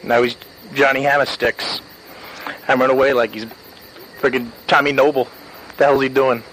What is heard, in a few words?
hammersticks; johnny